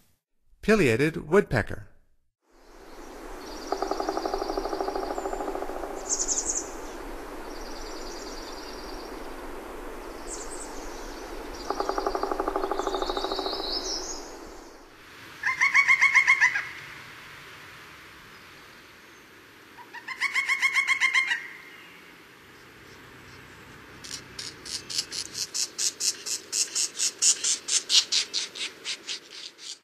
Pileated Woodpecker, Songs of Birds of North America; Stokes